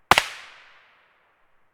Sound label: soil distant; Escape from Tarkov